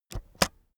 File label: keyboard external space press slow